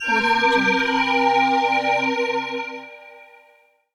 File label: holy magic light aura spell cast; stormwaveaudio